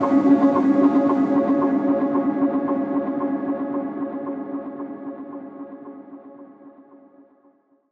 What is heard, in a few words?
C Hypno Guitar